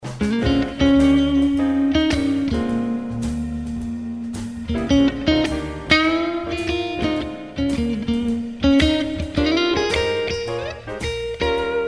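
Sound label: twin clean blues clean, guitar pickups; P90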